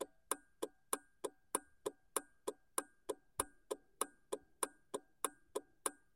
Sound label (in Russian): zvuki povorotnikov u avtomobilia